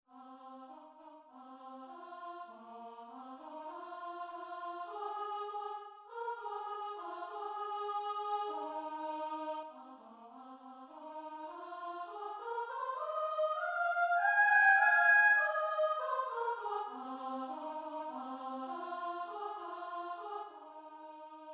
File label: pentatonic in es; for singers; pentatonic melody